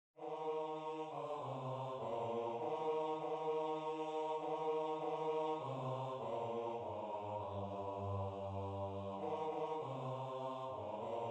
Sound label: Choir Bass